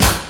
airy acoustic snare sound g key, snare drum sound tuned to the G note